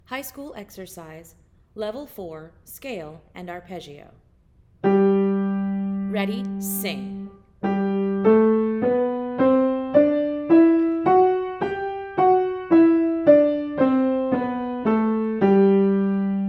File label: High School 4 in G